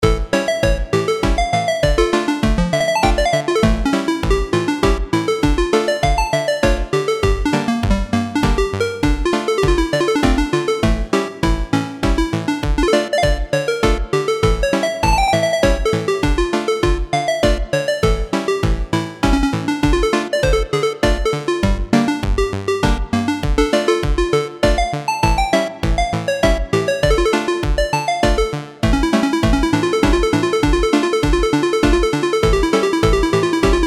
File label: Gypsy Alex (Chiptune)